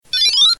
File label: RCX Fast Up